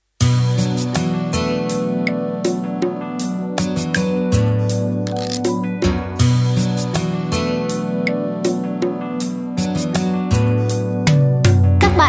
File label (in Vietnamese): beat